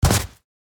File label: Footsteps on snow boots single step